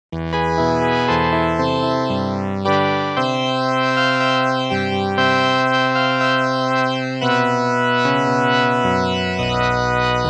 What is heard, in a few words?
moog opus 3; analog